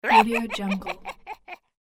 cartoon evil grandma laughter

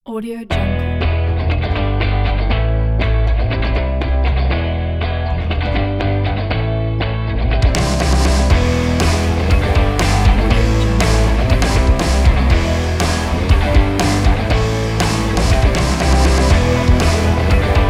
motivation rock background

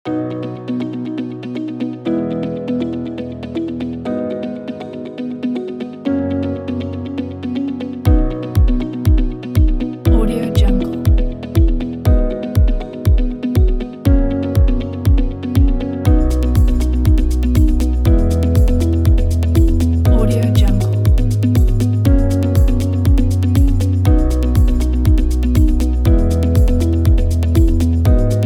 presentation background

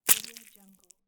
fleshy blood spill

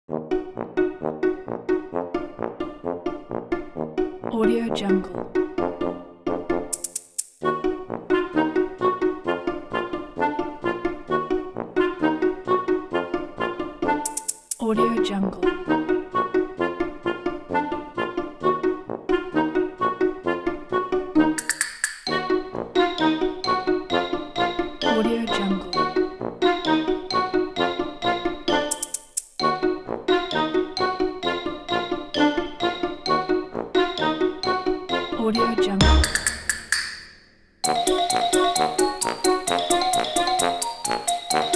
funny chase scene

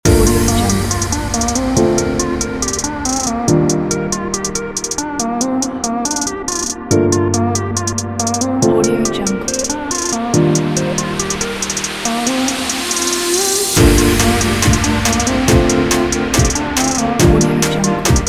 future bass

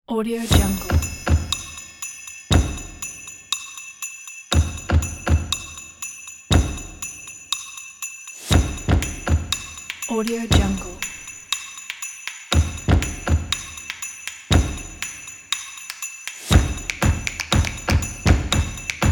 christmas percussion countdown; bluewhalemusic

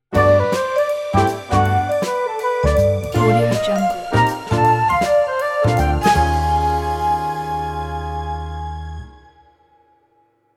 jazzy sitcom stinger transition

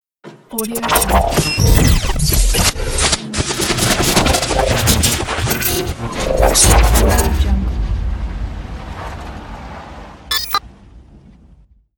glitch intro logo

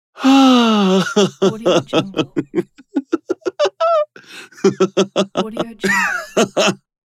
happy man laughs

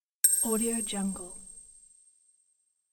bells sound; ib music

